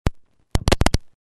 podkljuchenie mikrofonnogo razema k pultu ili narushenie kontakta

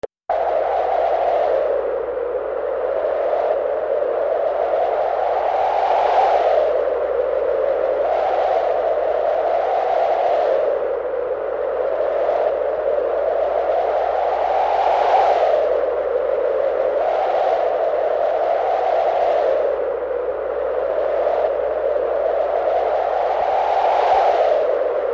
Zvuk silnogo svistiachego vetra

